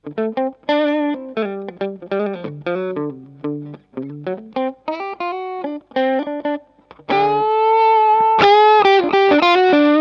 strat middle

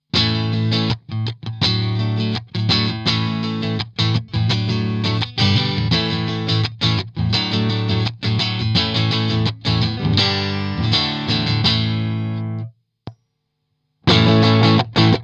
strat neck; 65 amps soho

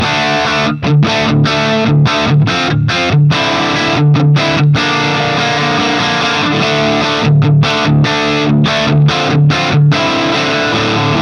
tele bassman cranked

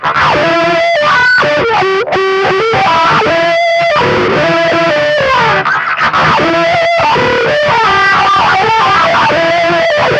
lead; gx700

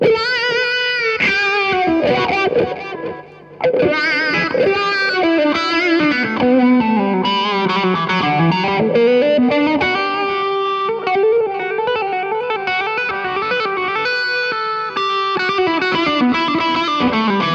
wah delay

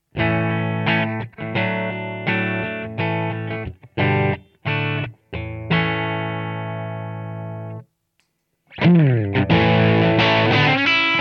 telecaster test; distortionplus

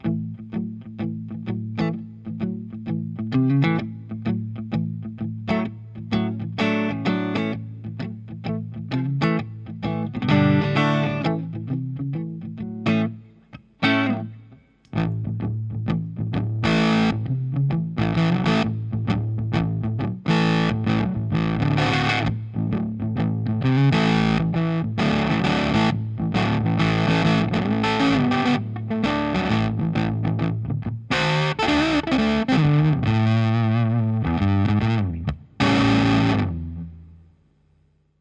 od850 strato kaula

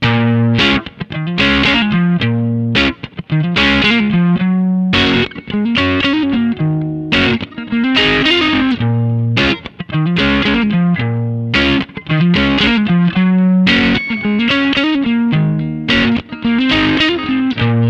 blues strato kaula; v-twin

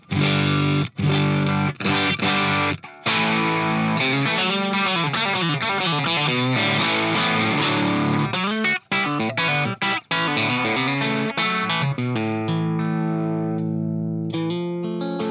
strat all

